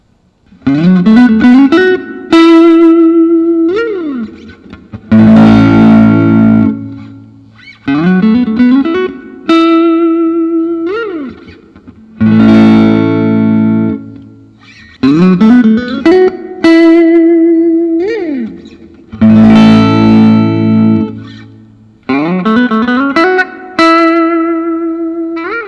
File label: hollow t classic; contoured; transparent blue; tom anderson